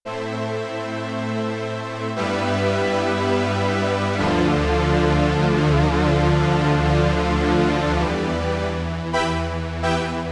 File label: Dynamic Filter, Analog Strings, Yamaha TX16W